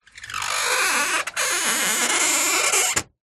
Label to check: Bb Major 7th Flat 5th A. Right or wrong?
Wrong